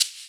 shaker sound sample D key

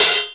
thin open hi hat sound F key; WAV (30000 Hz)

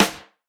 steel snare drum sample f key; snare drum samples

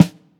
studio snare e key

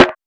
bassy steel snare drum sound f sharp key

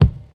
natural kickdrum sample d key; tuned drums d key

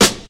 sizzle acoustic snare sample C sharp key; snare drum samples

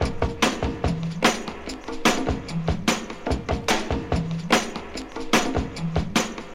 147 bpm hq drum loop g key

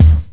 dope bass drum single shot d sharp key

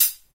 original closed high hat sound g sharp key

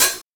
natural hat one shot g sharp key

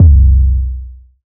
long bass drum sample c key

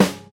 warm acoustic snare sound f sharp key

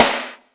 Steel Snare Drum Sound A#; snare drum samples

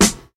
raw snare one shot b key

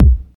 studio kick drum sound c key

